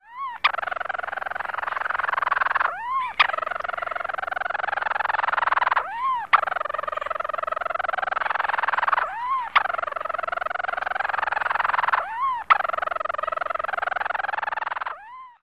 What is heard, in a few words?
purr calls from burrow